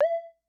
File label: Multi, Vermona DRM1-MK2